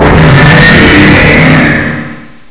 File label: dragon